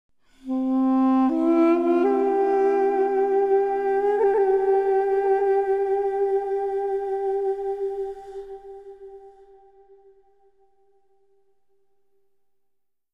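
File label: theme melody; Myst III Exile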